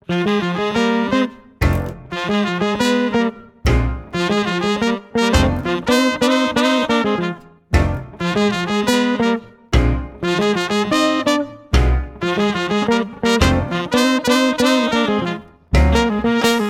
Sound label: Blues, Jazz Club Schlagzeug